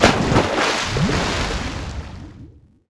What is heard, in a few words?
CRASH; Splashdown; XBOX